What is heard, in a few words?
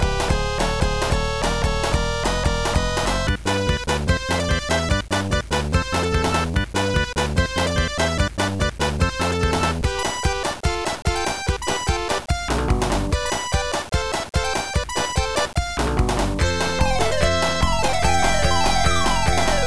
bg music; Sonic